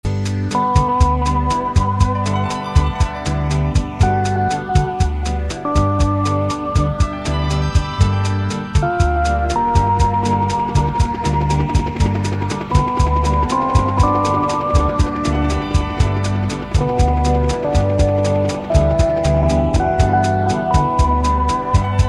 Microsynth

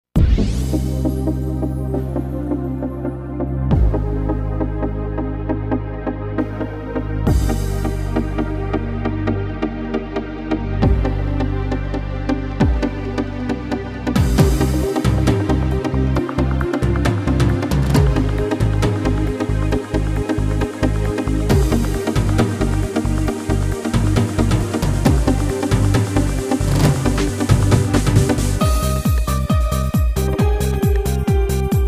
Tyros Style Pro Ibiza 2002

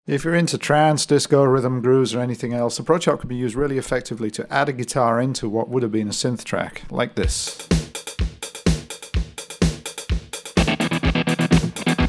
trance groove; GFX PROCHOP

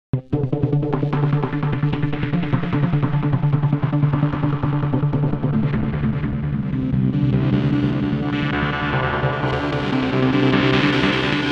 Synth Loop

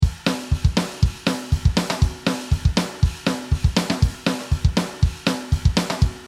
Punk Rock 120

